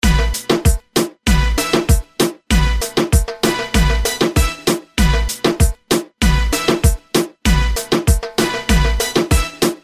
reggaeton piece ringtone

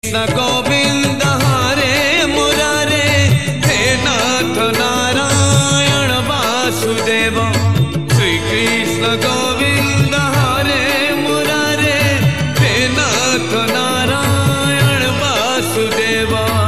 oriya bhajan ringtone

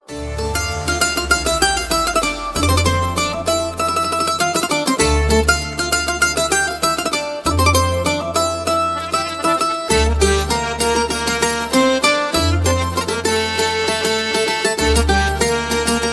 musical bollywood ringtone

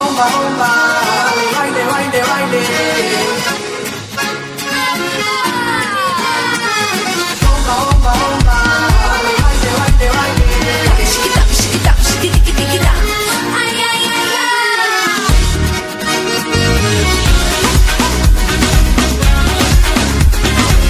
croatian ringtone

